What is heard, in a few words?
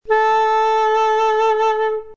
A4 unvented, flute; modern